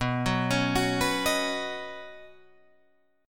B Major Add 9th B Guitar Drop D

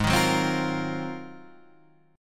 G sharp 13th, Guitar, Standard; strummed; JGuitar

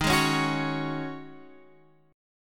Ab 7th Suspended 4th Eb

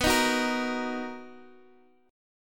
B Major 7th, Guitar Open D chord

